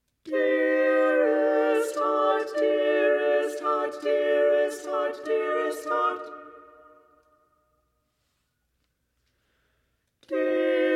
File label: 4 Voices & Percussion; No. 3, Dearest Heart